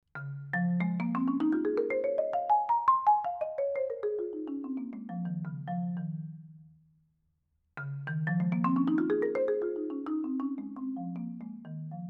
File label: marimba; benoit lavollee jazz vibraphone wand